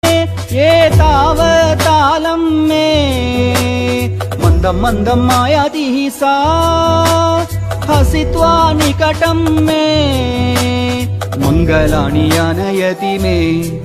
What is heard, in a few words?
sanskrit emotional